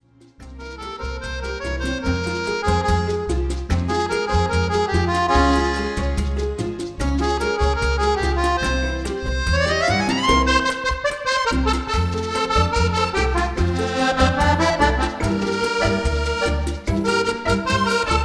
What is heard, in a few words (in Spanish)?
santa clara rumba